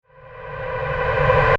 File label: Horror Reverse Ramp Vox; Scary Soundscapes; Production Element